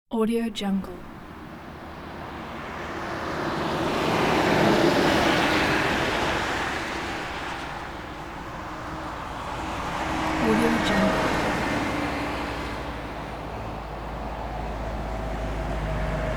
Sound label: motorway traffic not busy